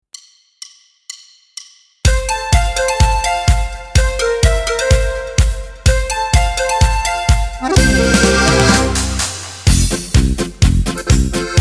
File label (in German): Jodelautomat Karaoke mit Chor